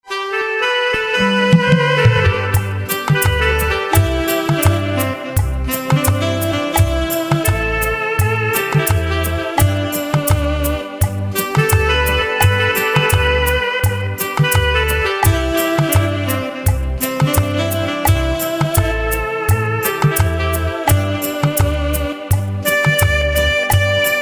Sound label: Saxophone